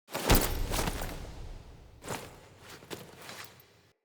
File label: Wake Up Foley; Cinematics; Bromius; Bromius Quest Wake Up Poppet